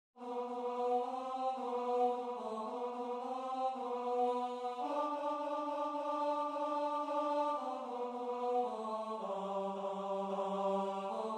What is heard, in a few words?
DOWN TO THE RIVER TO PRAY TENOR